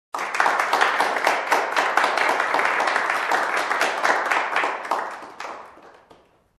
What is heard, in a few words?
Moderate Claps in an enclosure